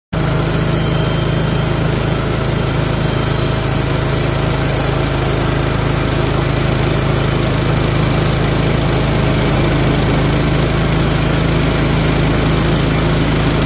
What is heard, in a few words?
sawmill; کارخانه چوب بری